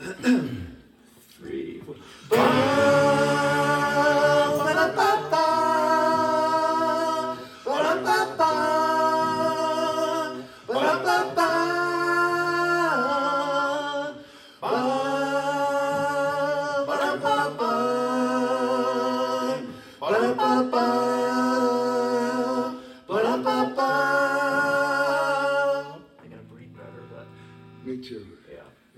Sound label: sans bass drums